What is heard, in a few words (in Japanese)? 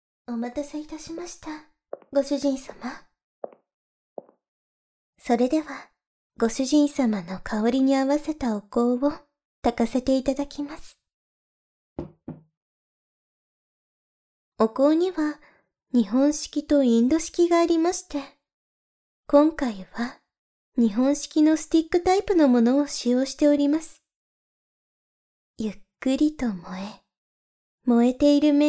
お香を聞きながらのまったり耳かき